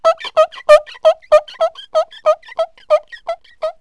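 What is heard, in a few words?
clean squeak; Wired for Sound Pro CD; Aristosoft, Inc.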